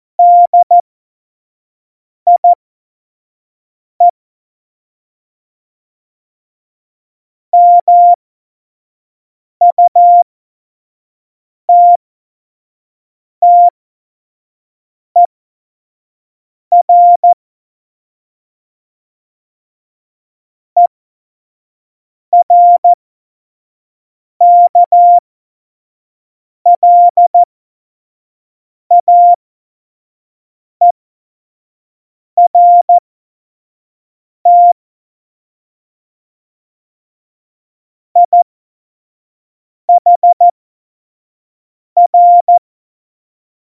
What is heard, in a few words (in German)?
Morsekurs, Tempo 25; Witze